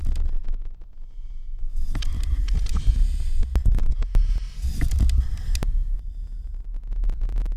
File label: noise loop